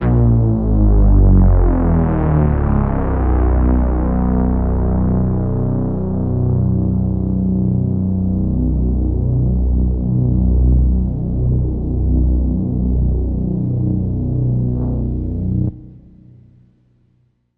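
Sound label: drone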